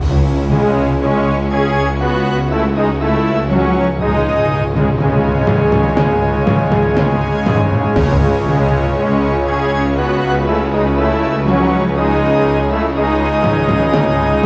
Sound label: Roman Fanfare Style